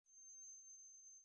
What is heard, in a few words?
trumpet